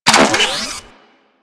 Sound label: Electro Mag Reload, Weapons; Warframe; Corpus